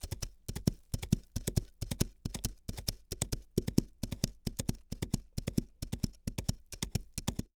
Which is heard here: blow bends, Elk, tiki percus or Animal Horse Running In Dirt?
Animal Horse Running In Dirt